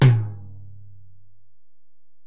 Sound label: Tom, Alesis DM-PRO, Drum Machines, Toms